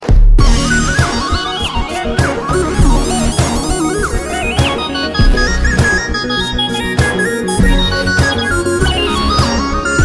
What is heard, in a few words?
space jazz